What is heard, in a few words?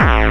bombo, kicks, Hardcore